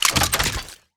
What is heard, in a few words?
Gun reload